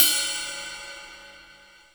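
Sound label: cymbal, left; Best Service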